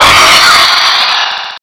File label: paint jumpscare sound; five nights at maggies 3